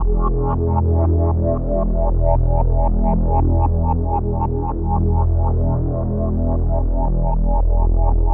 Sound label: 115 E Drone Rising Mod; Zero-G - Future Wave; Drones